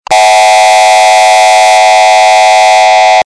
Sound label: Air Horn; remote compact 3920